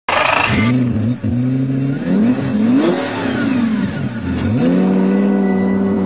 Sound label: Gallardo, lamborghini